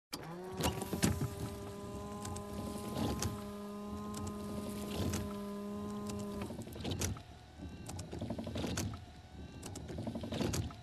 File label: Claning Windshield with washer fluid